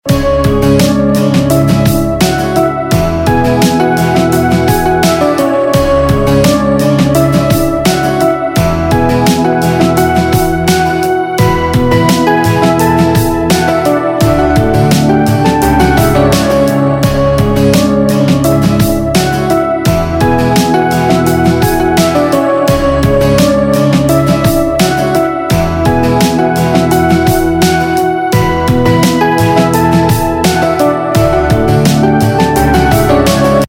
Instrumental Ringtone; Bollywood Hits (2014)

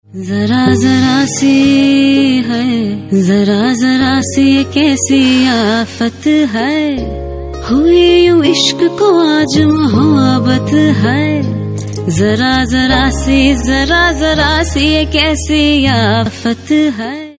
Perfume Spray TV ad song; Wild Stone No Gas

